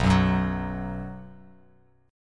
B 5th B down Bass Standard; strummed; JGuitar

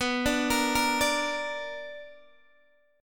B Minor Major 7th, Guitar, DADGAD

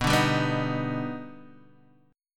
D sharp Augmented Major 7th B

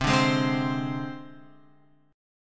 C Suspended 2nd Sharp 5th; Csus2#5 Chord {8 5 6 5 X 8} strummed

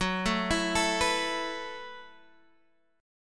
B 7th Suspended 4th F sharp

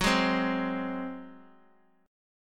Gb Suspended 2nd Flat 5th 4G,5C,5E,5A

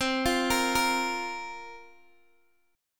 C 7th Suspended 4th, Guitar, DADGAD

